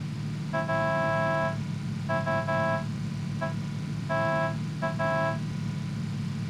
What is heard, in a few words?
UAZ honk